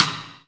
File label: LW WET SID, Drum Mashines, SR-16 DRUMS; EdgeSounds